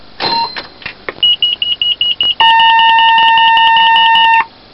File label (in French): demarrage pupitre, TGV Atlantique; OpenBVE; Train